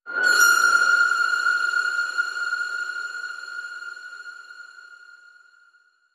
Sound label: Spooky Ping; Shadow Woods